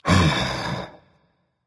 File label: thinking berserker; cuchulainn